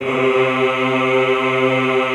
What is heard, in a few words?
EHH C2, Classical Choir; Best Service